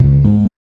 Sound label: BASS